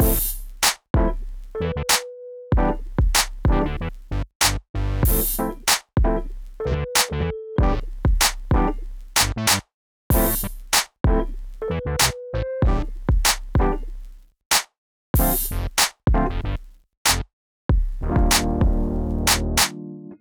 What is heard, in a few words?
e funk mix; o-clip 2.0